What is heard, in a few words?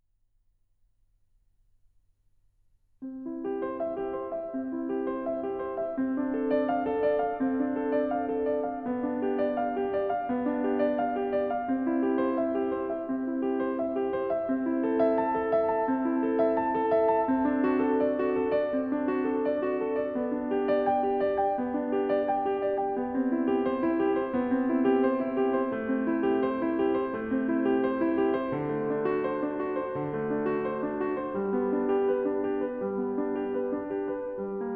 Prelude No.1 in C-dur BWV 846; Bach